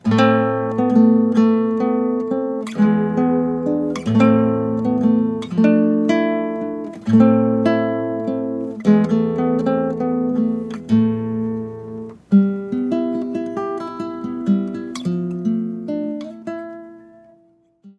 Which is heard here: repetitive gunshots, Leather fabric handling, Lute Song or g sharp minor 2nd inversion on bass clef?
Lute Song